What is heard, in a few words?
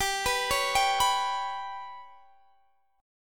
G Major Flat 5th, Guitar, Standard; arpeggiated